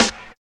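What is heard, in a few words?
dry acoustic snare A key, snare tuned to the A note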